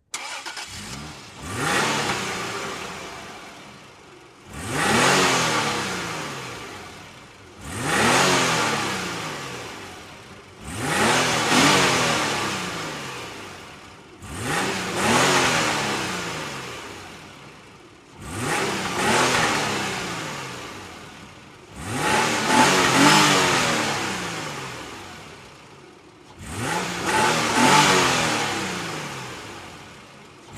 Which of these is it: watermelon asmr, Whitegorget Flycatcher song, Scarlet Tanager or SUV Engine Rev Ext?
SUV Engine Rev Ext